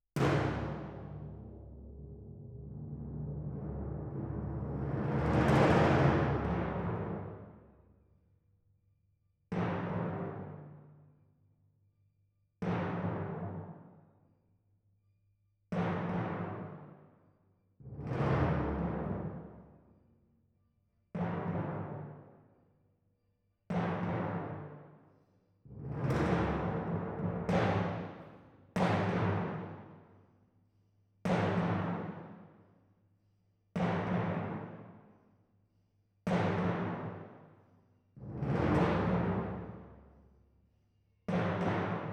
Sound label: Timp